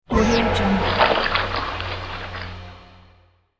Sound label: futuristic sludge cylinder